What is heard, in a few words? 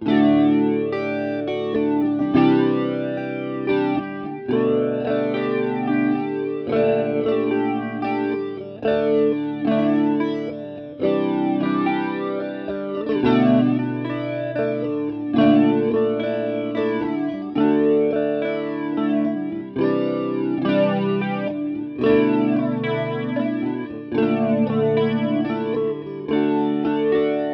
Pedal EFL